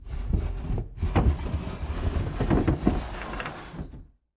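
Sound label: Dumb Waiter Door Raise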